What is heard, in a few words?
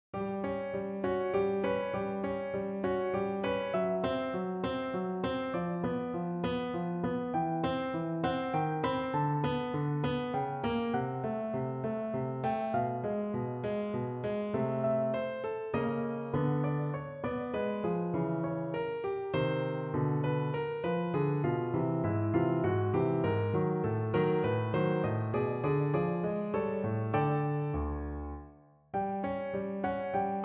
The clowns dancing piano excerpt